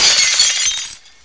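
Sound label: glass break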